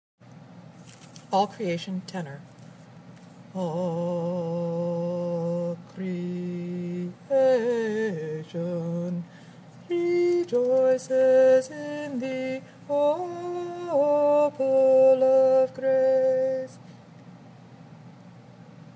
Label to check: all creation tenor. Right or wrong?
Right